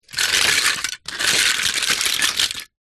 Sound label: toy cars metal pile movement